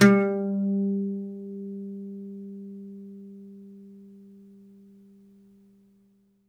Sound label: OUD G2, Plucked Strings; E-MU Producer Series Vol. 7 – Old World Instruments